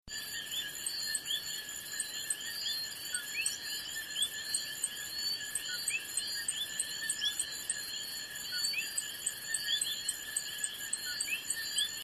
chorus - heard here among the calls of other members of the genus; eleutherodactylus juanariveroi; toa alta puerto rico; The call of this species is a very soft chirp, almost beyond the range of human hearing; West Indian